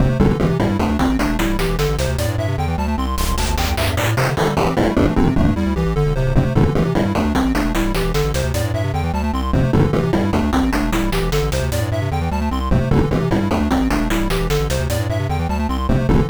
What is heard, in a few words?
beepbox song